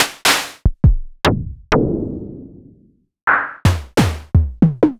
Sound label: Roland MKS-80 Drums